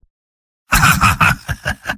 laugh short; spy; video games; source engine; tf; mvm

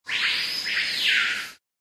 animal call bird; Source Engine; tf; lair

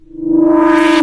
space asterisk, windows sound schemes; windows 9x

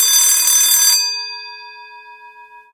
score100; Left 4 Dead 2; Source Engine

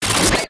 medi shield burn, weapons; tf

